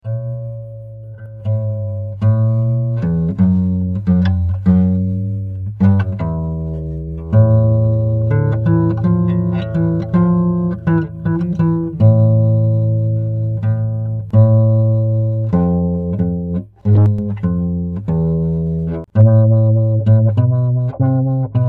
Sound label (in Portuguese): violao efeitos